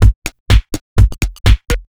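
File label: Robo Groove B, drum samples dance electro; drum сэмплы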